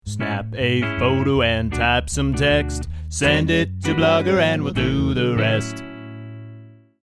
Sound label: mobile jingle